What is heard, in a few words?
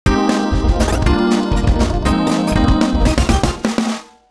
Pop; WSOLA; TSMtoolbox